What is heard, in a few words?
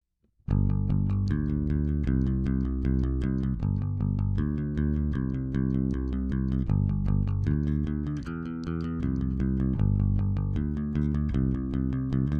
Bass Bday